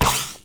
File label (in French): Tir, arc